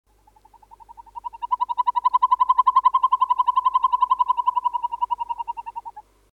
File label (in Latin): surnia ulula